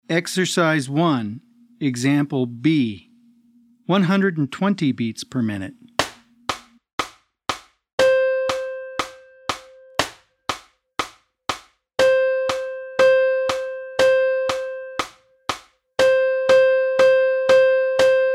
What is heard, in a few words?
120 BPM